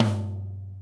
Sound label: drum